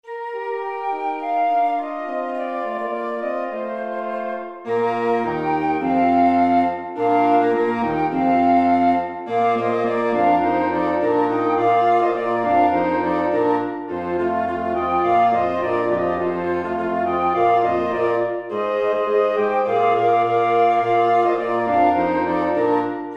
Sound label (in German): blaeserquintett